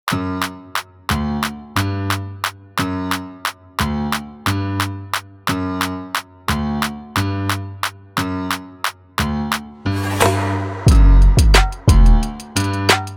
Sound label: Waka Underscore, Urban; Narrative Mind